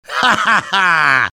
laugh short; demoman

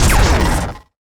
fire; skbt astro fleet

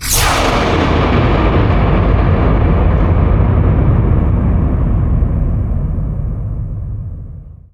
thunder, halloween ambient; Garry's Mod; tf2

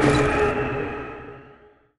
broom fly fade; taunt; Garry's Mod; tf2

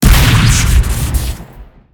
shotgun crit; weapons; tf2

